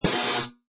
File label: Mechanical Static Notification; Scifi Production Elements, Set 2